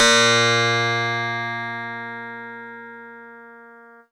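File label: Harpsichord DX100 A sharp 5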